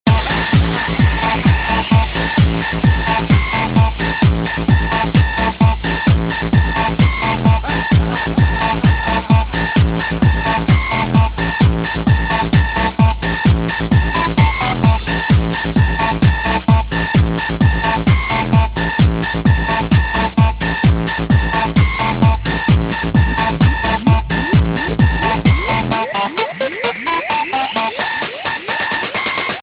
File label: electronic mixed; kazantip 2007